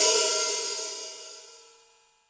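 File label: open hi-hat, Real Drum Kit, ROCK KIT; Best Service ProSamples vol.43 - Real Drum Kits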